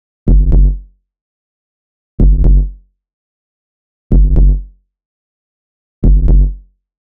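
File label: bass 125 bpm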